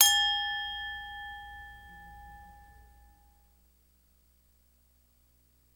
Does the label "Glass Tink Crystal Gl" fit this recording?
Yes